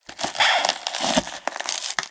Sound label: frozen; Scrat